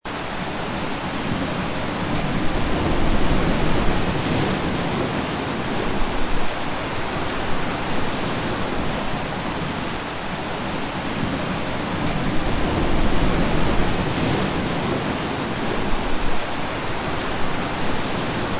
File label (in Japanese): 強風と荒波